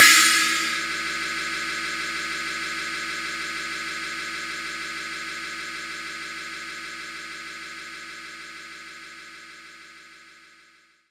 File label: Chinese Cymbal; knights try